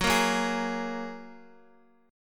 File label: F sharp Major Add 9th, Guitar; F#add9 strummed